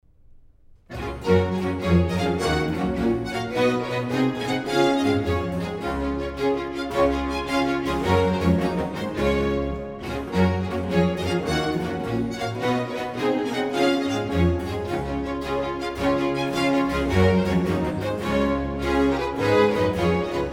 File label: Gigue; Handel; Passacaille, Gigue Menuet in G